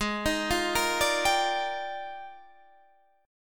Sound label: F Minor 13th, G sharp